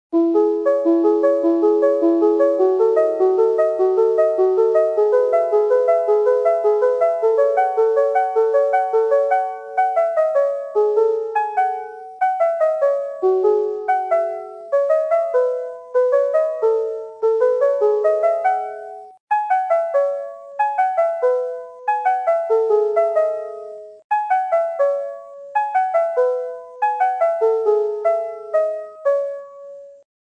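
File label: piano; Пианино1; Жанр: Легкая; нету текста это мелодия!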